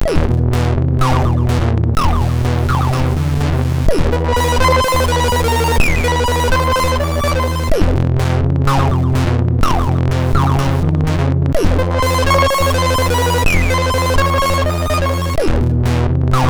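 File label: Nice Loop, CSG MOS6581R4; sid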